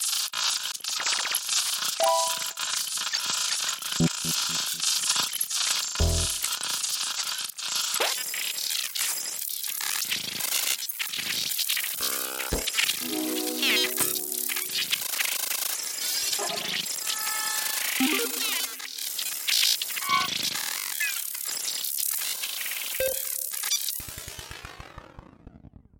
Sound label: User Interface; Glitchedtones